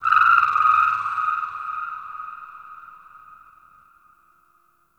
Sentient Texture Medium, Ambience; Sentient Lair

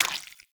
Footstep Default; Warframe; Pirate

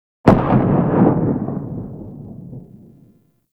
Arch Grn Skiff Drone Dist Explo, Explosions; Warframe; Archwing

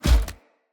Foley Armour Step; Characters; Enemy; Mockeries; SubBoss; Mockery

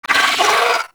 Vocal Pain; Warframe; Moa Satyr

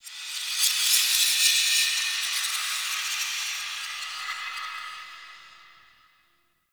Zariman Metal Whispers; Warframe; The New War

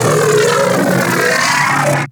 Arch Gun Alt Charge Full; Weapons; Warframe; Archwing; Hildryn

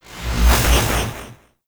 Transmission In Head In; Transmissions In Your Head

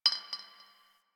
Garbage Glass Drop; Warframe; Ambience; Nineteen Ninety Nine

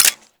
Rifle Mech; Zariman Grn Wraith

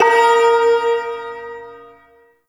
Shawzin Oct One A Sharp; Music; Warframe; Duviri; Ersatz Shawzin

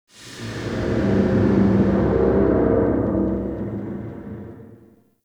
Distant Pipe Burst; Ambience; Warframe; Corpus Railjack

